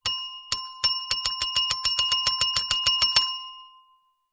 bell small reception desk bell multiple impatient rings